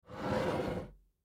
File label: Glass Cup Grab Slide Towards Drink Bar Counter; Dishware; Foley; Blastwave